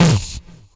VOC DRUM, MOUTH PERC; Best Service Hyper Dance

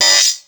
Hat X Open, Ensoniq ASR-X Pro

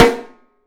Studio Snare

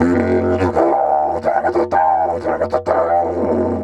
F#1 WILD, Didgeridoo; Big Fish Audio - Didgeridoo and Other Primitive Instruments

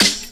SD, snares; EastWest; Dance Mega Hip Hop Electro

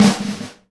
SNARE RUFF, Live Snares; PlatinumPhattCD1; E-MU Producer Series Vol. 8 – Platinum Phatt

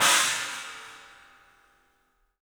CYMBALS; 3D Sound; 3D Drums

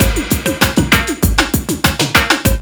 DRUMLOOP, Ragga & Dub Reggae

